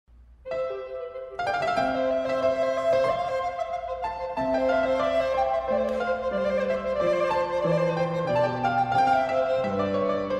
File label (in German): Sonate C-Dur